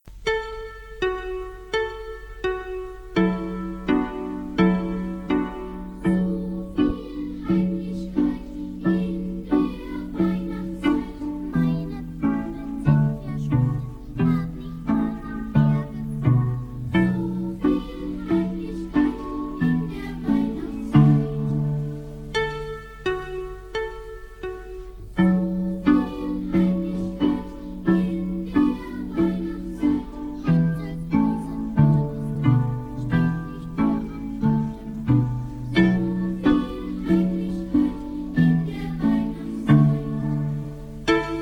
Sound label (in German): So viel Heimlichkeit ohne Gesang D-Dur